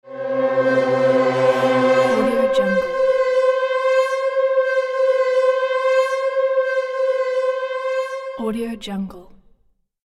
pulse drone

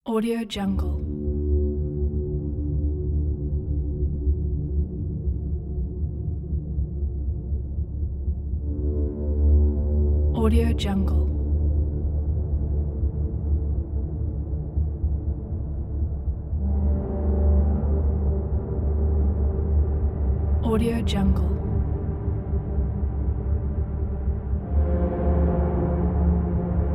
dark space ambient trailer

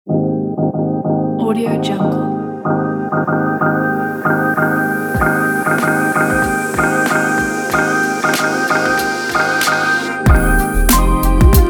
chopped uplifting hip hop

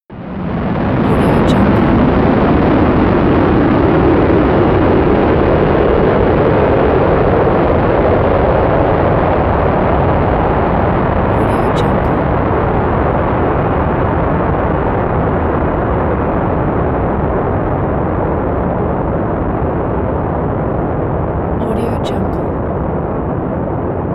rocket launch

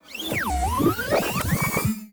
servo morph hitech; soundmorph

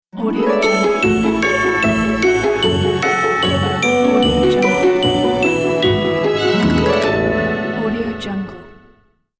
christmas cartoon logo

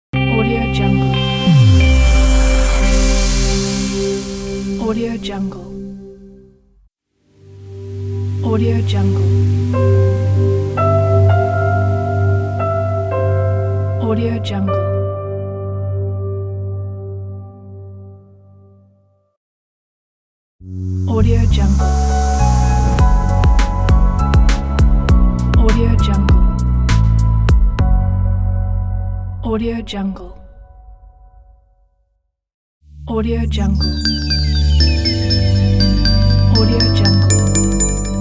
logo pack

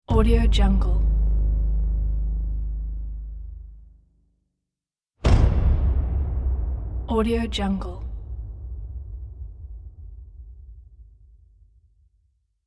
trailer hits

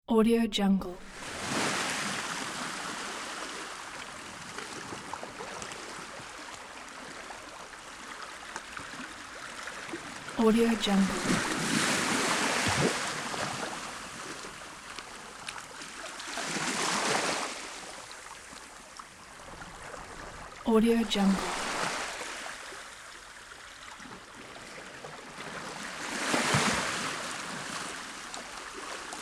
ocean waves; vinyl sounds

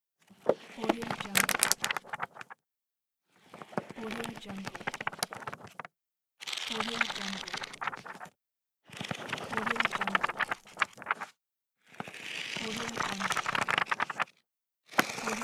flipping book pages

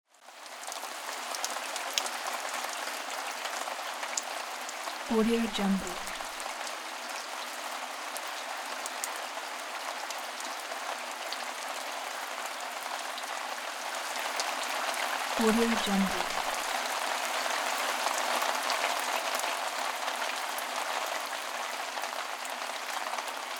rain drops

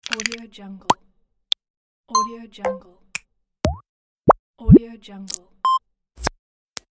clean design button infographic pack

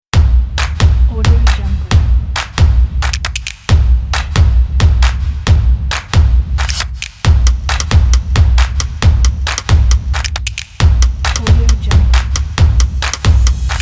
percussion kit; zverev

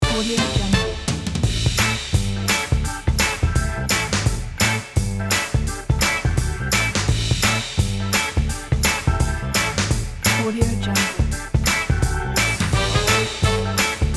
60s blues rock groove

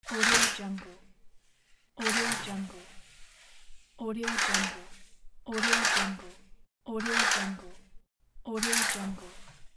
curtain

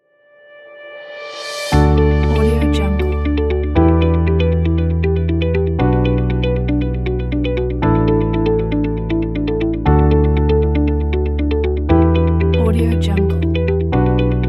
ambient technology world pack

